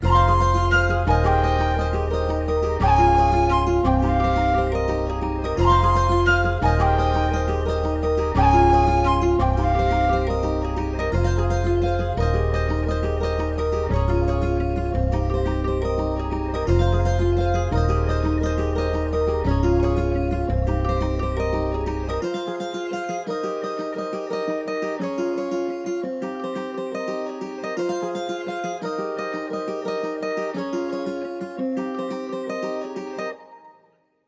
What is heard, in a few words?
173 BPM F Min, Melody, Trap; Cymatics; 2022 Melody Collection; Trap Pt 1